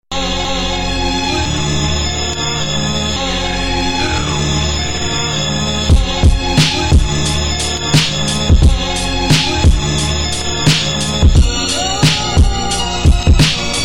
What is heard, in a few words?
MPC1000 Beat